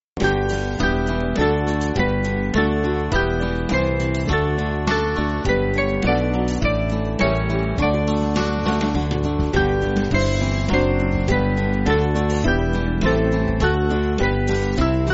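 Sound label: Band, Backing Files for Churches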